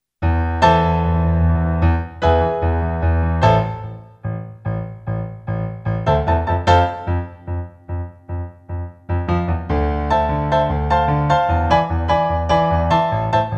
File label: accompaniment; ARIEL